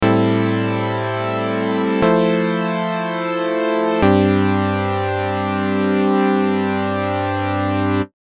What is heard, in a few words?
retro synth no arp